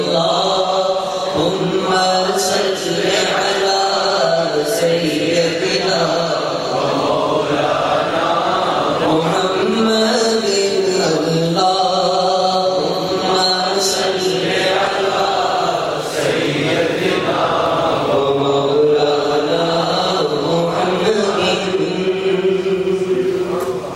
Naat Ringtone

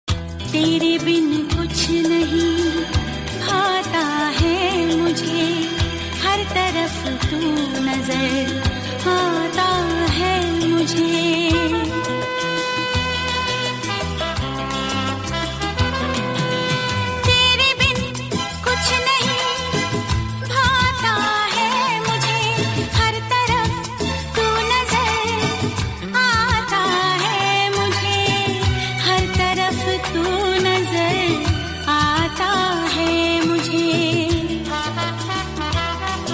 Old Ringtone

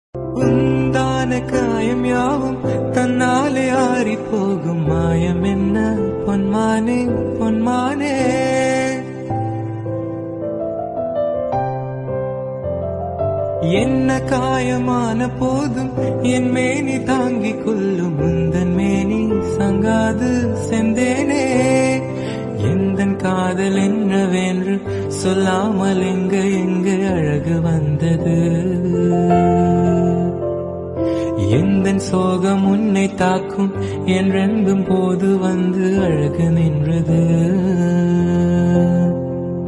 Tamil Ringtone

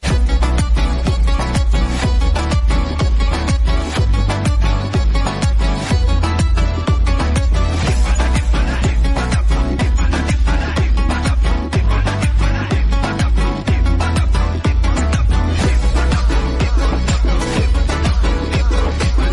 Bgm Ringtone

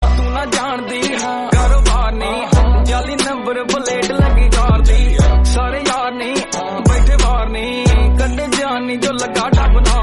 Song Ringtone

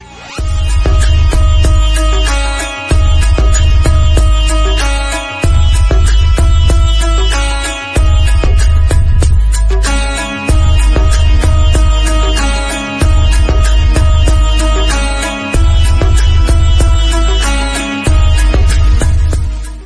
Instrumental Music

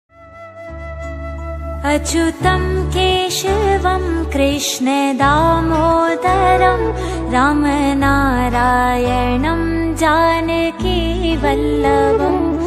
Bhajan Ringtone

